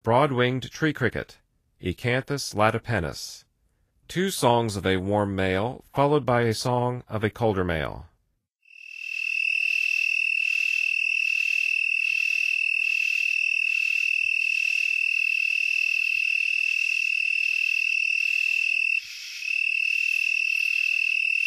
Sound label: Broad-winged Tree Cricket, Songs of Insects